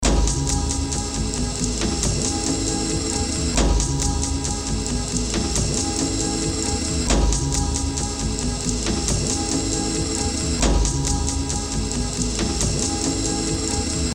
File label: musical fx loop